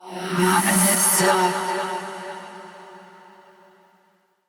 Hand Compass Vocal; Warframe; Duviri

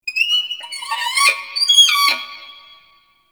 Owl Critters Vocal Idle; Ambience; Duviri

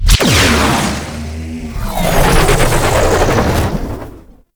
Sentient Vessel Parazon Jump; Warframe; Tau

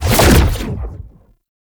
Teleport Reappear; Enemies; Abilities; Warframe; Tau; Dax Ninja

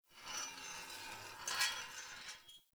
Metal Screech Small; Props; Warframe; Tau; Ambience

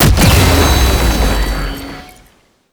Defense Speaker Burst; Warframe; Nineteeen Ninety Nine

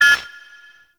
Interior Orbital Station Console Count; Warframe; Tau

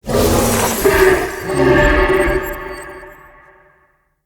stag wild exclaim summon start; Powers; Stag Pact

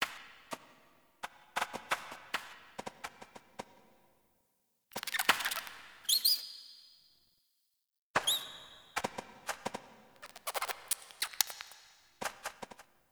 Rat; Awaken; Verminia Quest

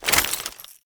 Bayonet Melee Whoosh Gear Accent; Warframe; Tenno

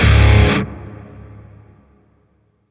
Shawzin Phrygian Chord B; Music; Warframe; Grineer; Chords

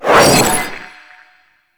Glass Shank Cast; Warframe

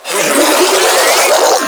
Spin Scream; Enemies; Abilities; Warframe; Proto Infested Ancient

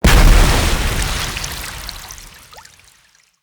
Claw Slam Impact Water; Attacks; Animals; Bromius

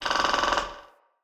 Tree Pine Branch Creak; Trees; Midrath Island; Ambience

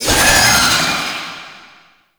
Summon Vocal Verb; Warframe; Uriel; Gulphagor

